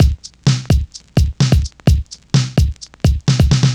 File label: Housebeats, 128BPM; Vengeance Samples; VEH2 Loops